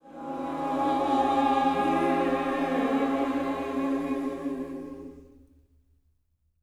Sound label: SPIRIT END, Vocal Planet Gospel; SPIRIT SNG